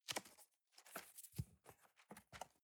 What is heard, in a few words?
cardboard box close fold